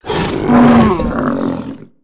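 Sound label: bantha attack; Star Wars Knights Of The Old Republic I; XBOX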